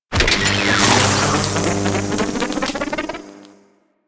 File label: Lure Gain Power; Warframe; Teralyst; Vomvalyst Lure